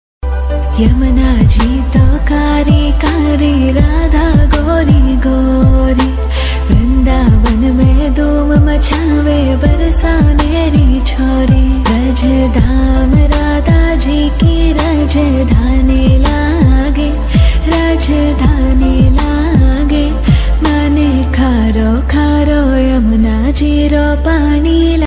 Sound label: lord krishna bhajan ringtone; janmashtami ringtone